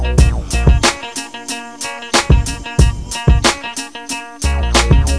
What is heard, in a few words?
timba beat